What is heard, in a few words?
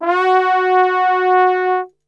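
F#3 trombone gliss, Brass; Best Service; Brass Super Section; TRMB GLISS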